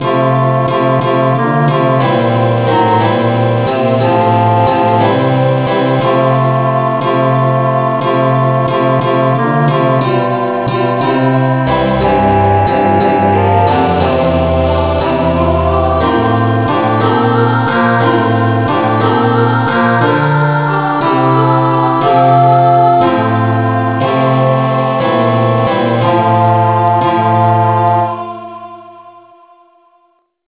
angel voices rev no vocal key f

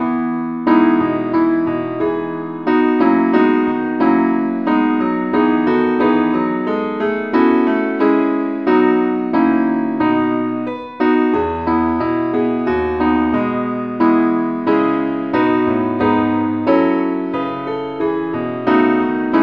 keyboard only key e

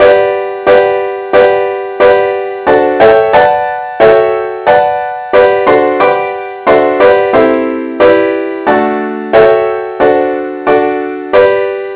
compare Chords; saintthomas

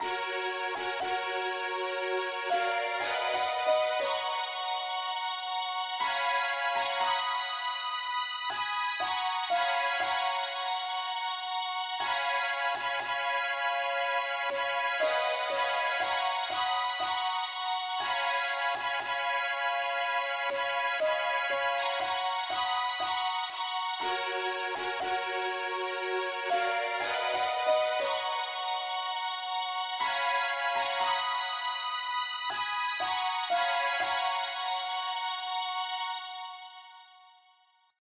mandolin Lead, key ges

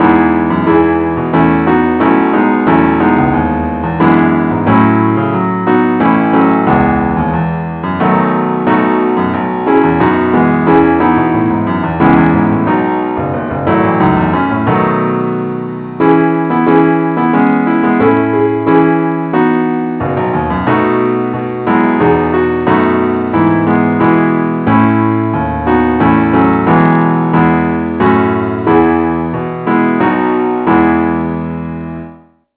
keyboard only key e

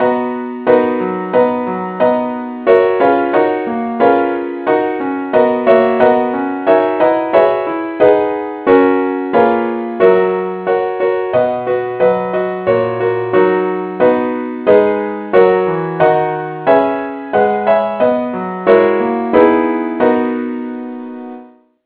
keyboard key B; saintthomas

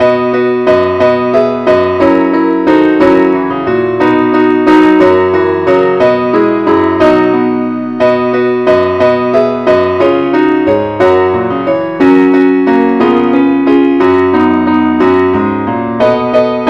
keyboard only key B; angelvoices

